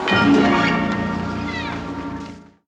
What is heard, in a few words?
Strum; Twilight Zone